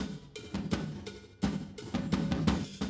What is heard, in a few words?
Classic DrumLoops